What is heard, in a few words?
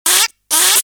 ducky monster